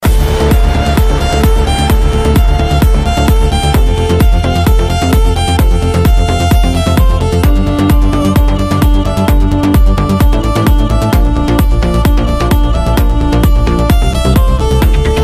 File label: violins; ringtone